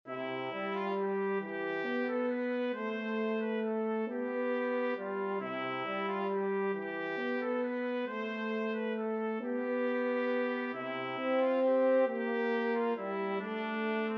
trumpet french horn duet

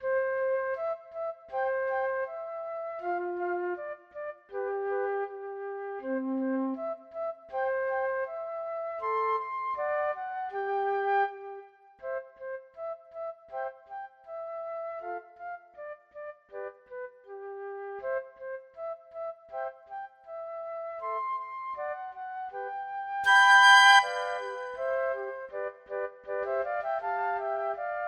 surprise, flute trio

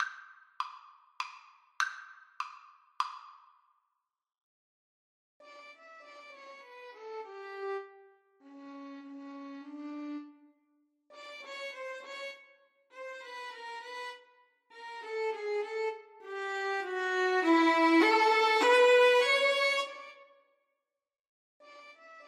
violin duet

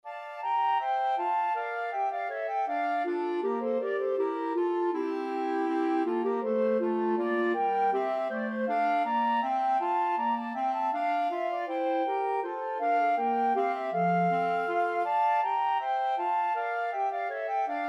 bourree, flute clarinet bass clarinet

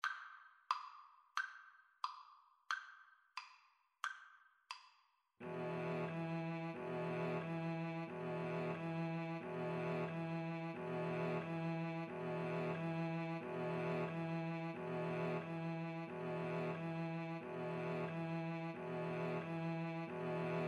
bartok romanian folkdance, violin cello; Violin-Cello Duet

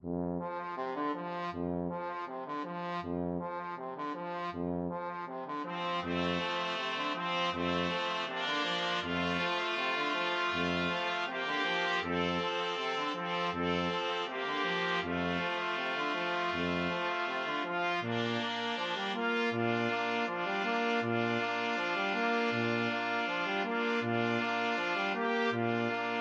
BRASS QUARTET

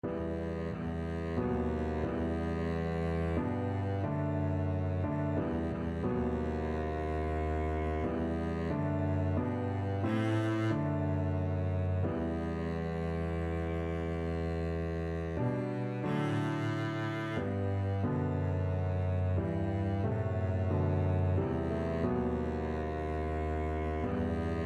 coventry, Double Bass

